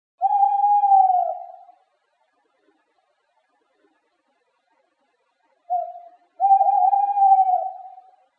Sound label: Owl Hoot